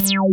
TECH REZ BS; Pro-Rec - Synthsonic; BASSES

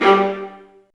STR VA3JE0XL, Roland LA Composer strings; Roland - LA Composer vol. 1

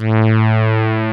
SOFT BASS; Masterbits; Dance Trax

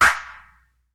CLAP, Dance Drums

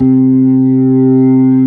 B3, Drawbars; Keyboards; E-MU Producer Series Vol. 2; More Studio Essentials

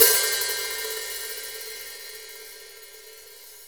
open hi hat; Giga Samples Collection; Junkie Love Drum

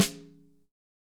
SNARES DRY; Real Mega Drums

